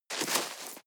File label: step snow walk single soft; natur-tiere; schnee